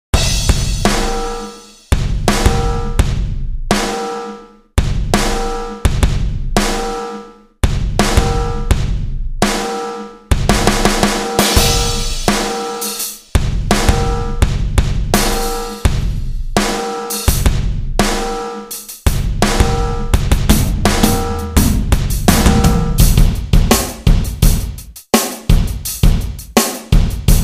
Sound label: ambient kit